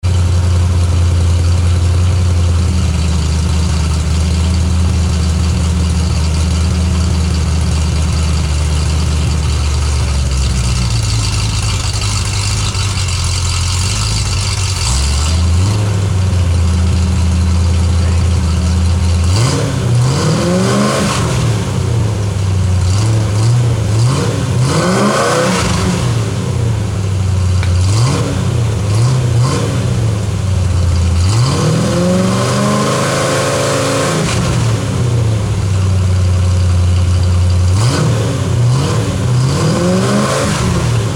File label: pypes exhaust street pro, 73 vette; c3; anyone installed a pypes system yet